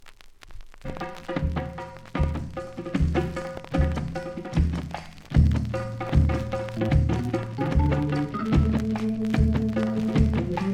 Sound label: Soul Drums; ROCKSTEADY